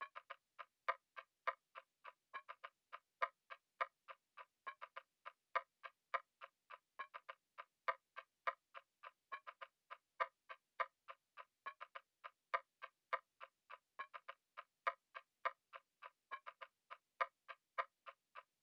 West Coast Melody Loop - 103 BPM C# Min Percussion; Melodies; Loop Stems; Cymatics; Oracle Sample Pack